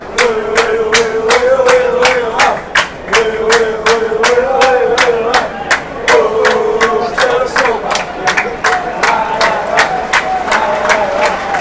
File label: wolves chant